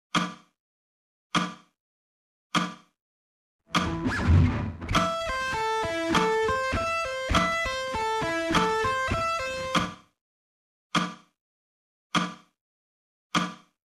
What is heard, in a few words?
sweep picking slow